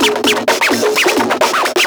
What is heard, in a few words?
Minimal Fills; Minimal Loops